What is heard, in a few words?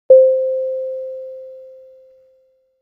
Elevator ding sound effect